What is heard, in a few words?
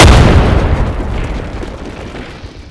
explode